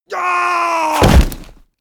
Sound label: infantry die